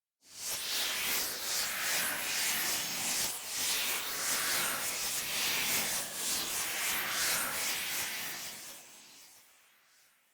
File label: swoosh